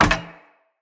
Player Torch Drop; Weapons; Blunt

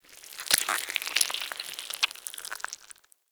Acid Squelch; Ambience; Bromius Inside

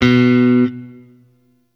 Shawzin Oct Two B; Warframe; Sentient Shawzin

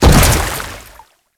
Grineer Land Water, Footsteps; Enemies; Warframe

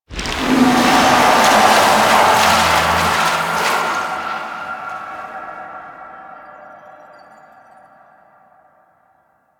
Hex Melt; gameplay; Warframe; NineteenNinetyNine; Stage11FuturePast

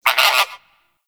Forest Creature Mid Medium; Warframe; Grineer

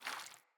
Rat Guide Water Step, Foley

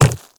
Quadruped Medium Walk Default, Footsteps

